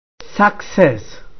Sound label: success